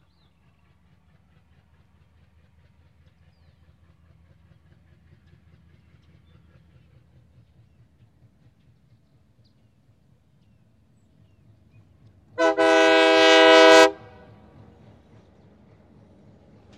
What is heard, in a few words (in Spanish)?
TREN PASAJE ALTIPLANO CON SILBATO